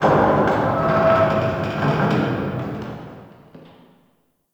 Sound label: Underground Metal Creak; Ambience; Warframe; Nokko Colony